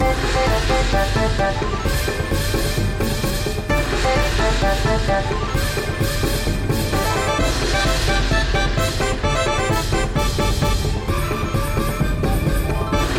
Free Spin Bgm; Shengxiao

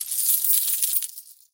fish few coins collect; fdfish

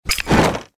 sound window; longhudazhan

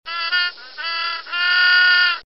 game lose; goldenflower

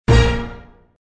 Scatter Second; hockey

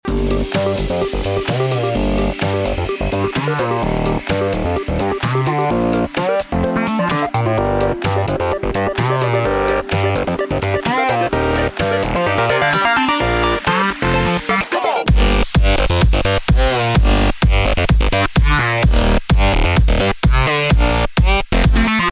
BACK GROUND FREE; luxurycar

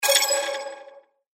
win lucky lighter; redvsblack

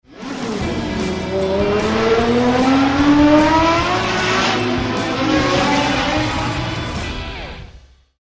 sound car selected

